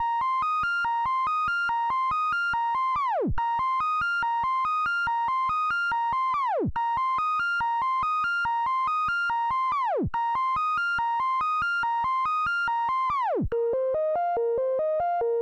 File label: 142 BPM F Min Sine, loop stems; Melody; Cymatics; 2022 Melody Collection; Wavy; Filthy